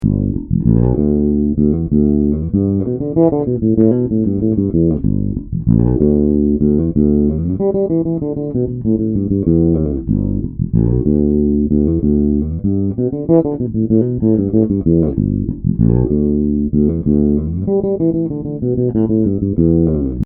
Jaco Bass

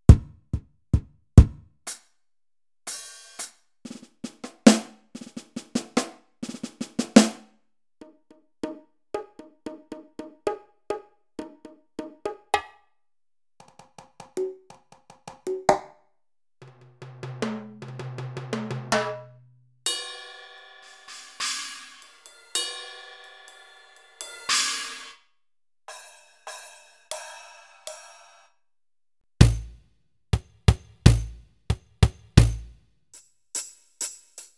Kit

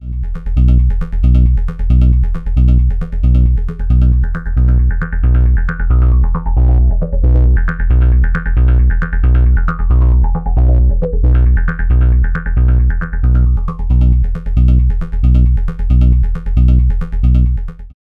Enhancement Dry Wet; Elektron Analog Heat

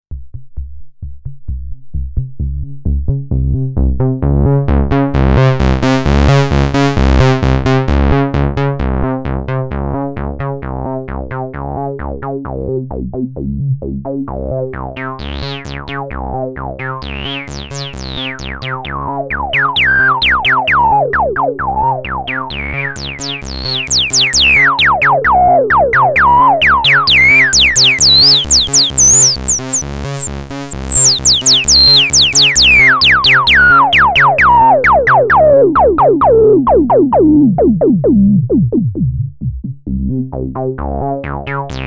Filter

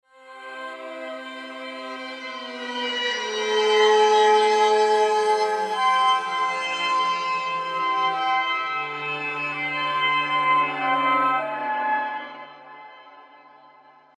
Combing Drone; Arturia Augmented Voices Efx FRAGMENTS

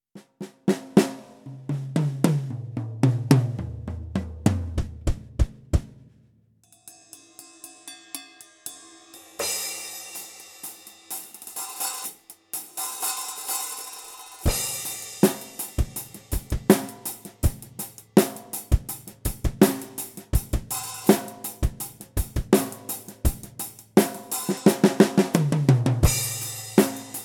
Studio Kit NoFX; Millenium

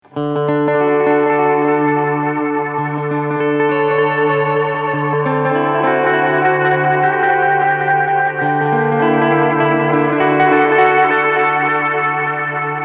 Catalinbread Echorec Head 1 Head 4

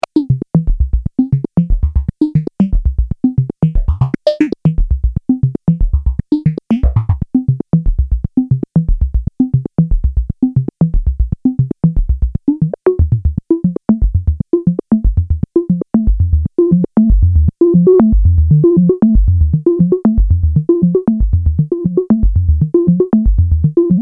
Filter Rez Toms

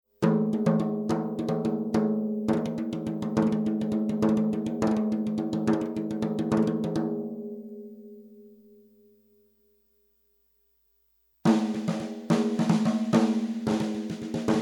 Mapex Snare SM 57 Millennia HV 35

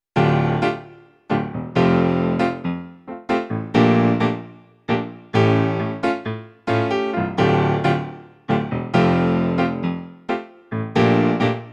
P45 Grand Piano

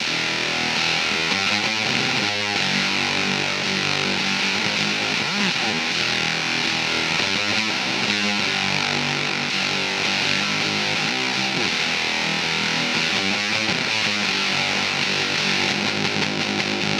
Teisco Fuzz

